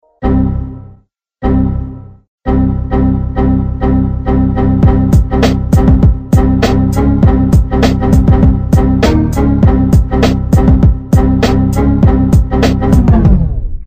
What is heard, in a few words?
windows xp errors song